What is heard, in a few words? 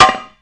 repair FAIL; The Elder Scrolls III - Morrowind; Morrowind GOTY GOG